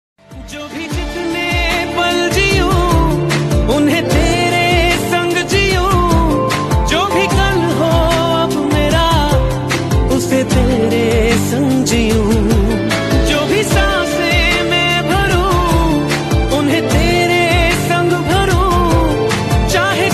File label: Romantic Hindi Song; ringtones